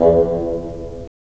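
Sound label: WND BN, wind bassoon; LA Composer vol. 3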